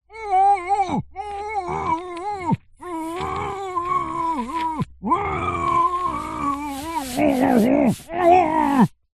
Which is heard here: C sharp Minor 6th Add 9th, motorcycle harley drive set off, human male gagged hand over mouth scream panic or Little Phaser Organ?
human male gagged hand over mouth scream panic